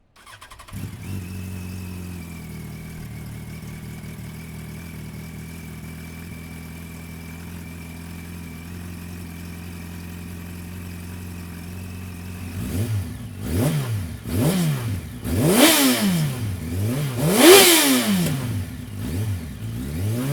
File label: yamaha 1000cc